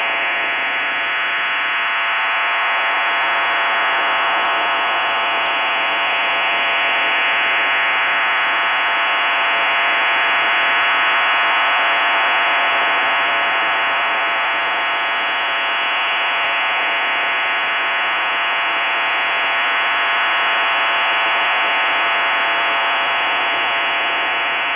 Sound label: pi-4 dqpsk